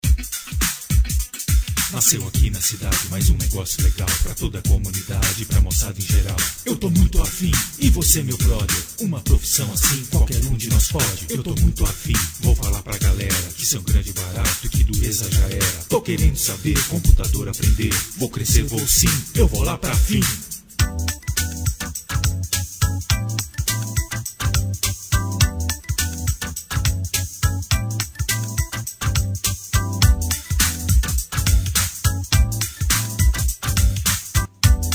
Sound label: rap